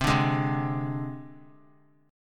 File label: C Suspended 2nd Sharp 5th, Csus2#5 chord; strummed; JGuitar